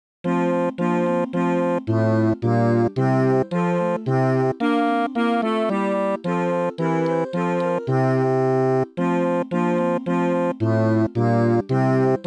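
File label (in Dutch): bas, koor; cantorij; komt verwondert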